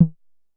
Drum X Cowbell B, Pearl

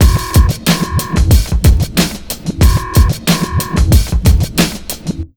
BEAT, Underground Hip Hop

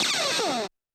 percussion, Roland TD-20

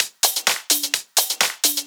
Discohouse Loop 128 BPM

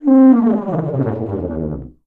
C TUBA FALL

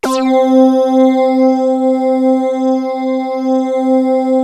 SYN LEAD; MORPHEUS

